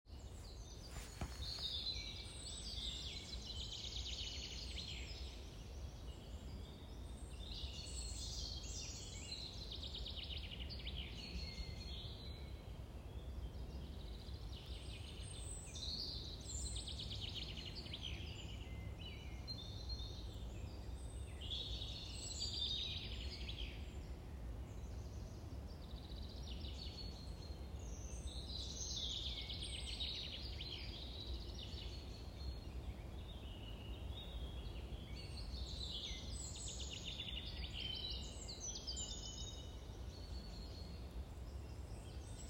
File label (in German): Waldgeräusche Meditation